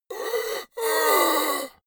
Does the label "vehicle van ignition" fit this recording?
No